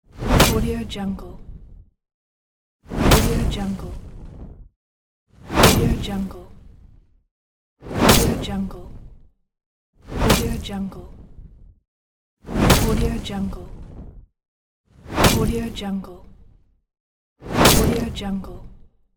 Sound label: fire sword on leather armour